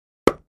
Axe 2x4, axe hits

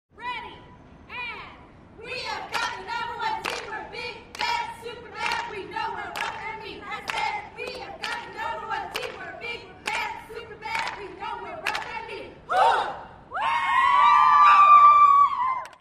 Cheerleaders Cheer

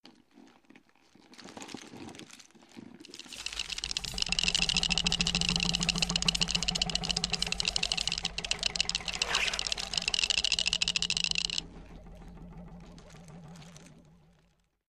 Robot Body Movement, cartoon gadget fx

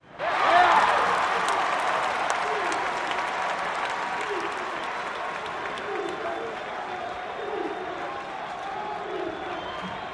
GYM CROWD CHEER; gyms

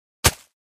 Foliage Impact Object Dropped on Ground; hits & impacts

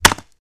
Staff Wood Hit

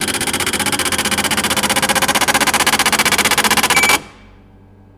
Dot Matrix Printer; Dot Matrix Computer Printer; printers

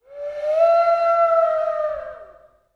Columbian Flute

